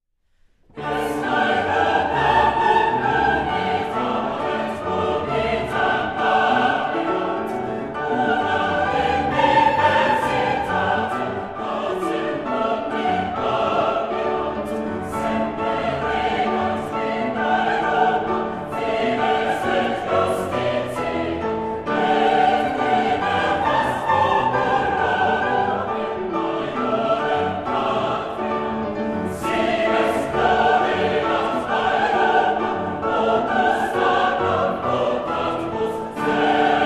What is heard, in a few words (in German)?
chor